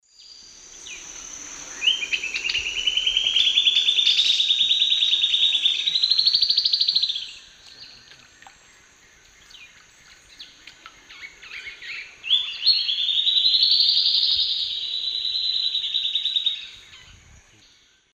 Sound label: Sclateria naevia, duet; S. America; Eastern Lowlands